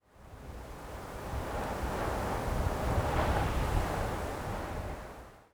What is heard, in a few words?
wind gust; Counter Strike Model Pack; CS:GO